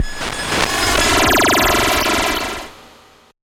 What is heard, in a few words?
woosh; sonniss gdc